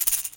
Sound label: Short shake; Chabyrinthe teaser